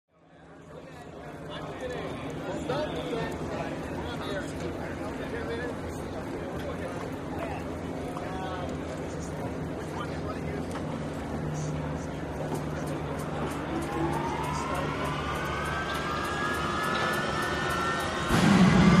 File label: Hydroplane Dock Amb